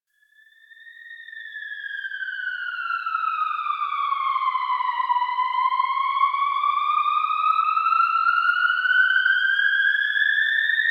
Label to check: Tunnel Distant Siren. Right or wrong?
Right